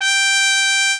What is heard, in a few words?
mexican trumpet